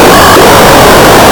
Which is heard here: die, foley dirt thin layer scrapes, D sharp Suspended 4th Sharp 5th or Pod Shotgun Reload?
die